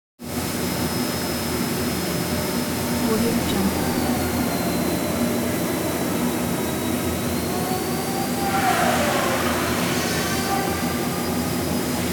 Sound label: wood shop general ambience